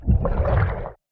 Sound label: underwater swim single; soundscrate